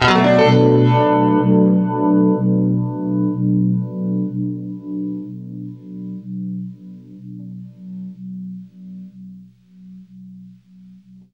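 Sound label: univibe Bm7 left, guitar; East West; Steve Stevens Guitar Samples Collection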